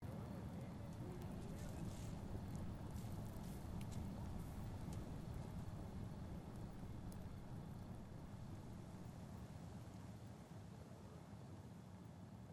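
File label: ambience temple loop; ambient; everdawn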